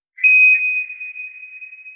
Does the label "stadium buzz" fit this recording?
No